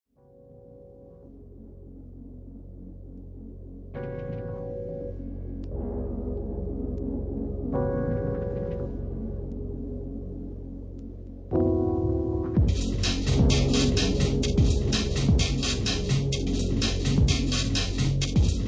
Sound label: Jazzy